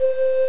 blower

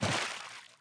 wet step; DAoC

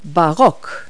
BAROQUE; Multi-Lingual Talking Picture Dictionary

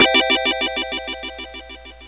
Big Win; LaserWriter IINT

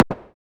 HAMMER

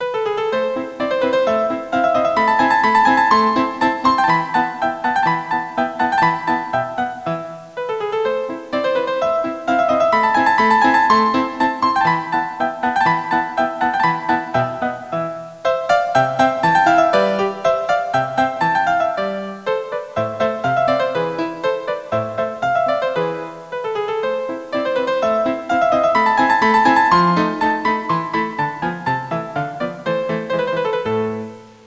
rondo

